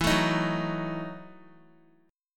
E Major 7th Suspended 2nd Suspended 4th, Guitar; EM7sus2sus4 strummed

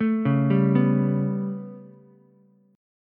B 7th Suspended 2nd Sharp 5th down Ukulele, chord; B7sus2#5; arpeggiated; JGuitar

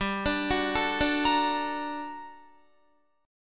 G Minor Major 9th, down, Guitar; arpeggiated; JGuitar

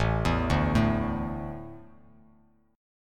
A Suspended 4th, Bass Standard, Asus4 chord; Asus4 arpeggiated; JGuitar

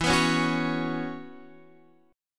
B Suspended 2nd Suspended 4th E, chord

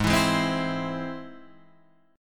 G sharp Augmented

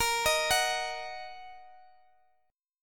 A sharp Suspended 4th Sharp 5th Guitar Standard; arpeggiated; A#sus4#5; JGuitar

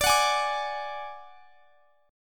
D Major Flat 5th; strummed; x,x,24,23,21,22; chord

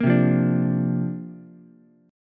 C 9th Suspended 4th Ukulele C6; strummed; C9sus4

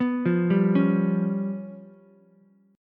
Db 7th Flat 5th, Ukulele; arpeggiated; 4,5,3,4; JGuitar

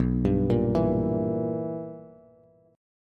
B Major 7th Suspended 4th Sharp 5th Bass, chord; BM7sus4#5; arpeggiated; JGuitar

